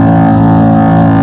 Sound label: RUMBLE; AOS F1 2022